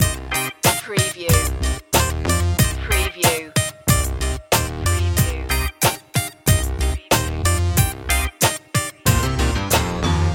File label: urban cossacks loop